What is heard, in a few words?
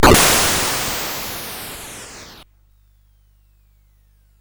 Frantic, CSG8580R5